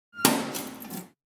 Briefkasten schliessen

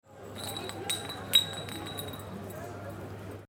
Flasche

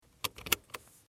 Auto anschnallen

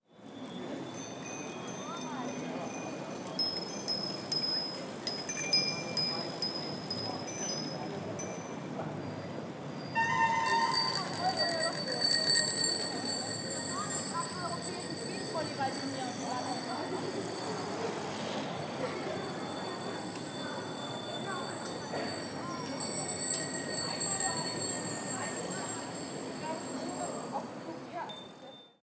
fahrraeder

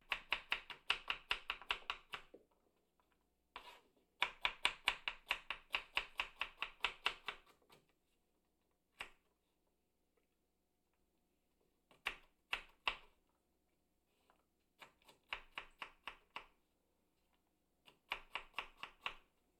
In Der Kueche Gemuese Zerkleinern

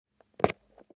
Mikrofonbox auf

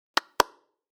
Hand klatschen

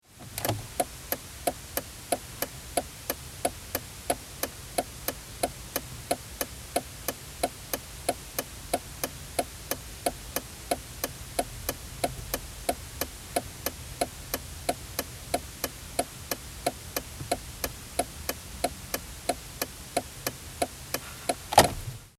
Auto Blinker

